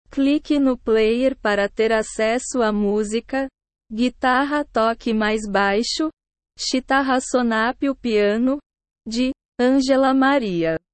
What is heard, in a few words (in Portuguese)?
guitarra toque mais baixo, piano